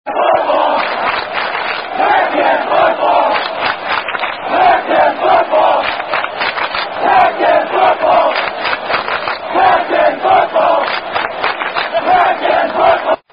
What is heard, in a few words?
Football Chant; PAC10